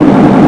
siren loop; io tracks circuit; sidesanctd

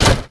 hit

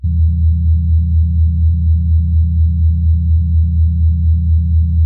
dog bark

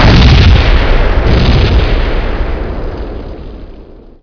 explosion

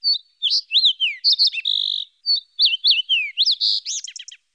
birds

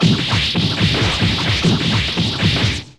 fuse

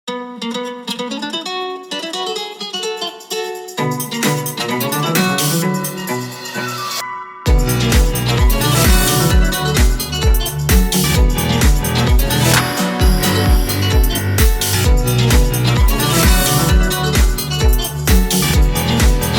medieval wave; medieval-redux

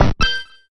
menu left right

